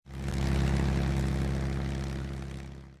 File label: Plane Airdrop